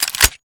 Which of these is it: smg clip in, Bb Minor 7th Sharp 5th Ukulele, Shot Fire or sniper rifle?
smg clip in